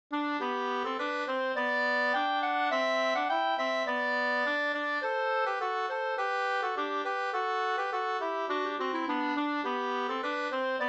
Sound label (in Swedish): ganglat